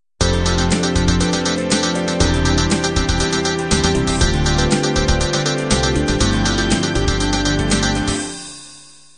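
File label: standard 16 beat intro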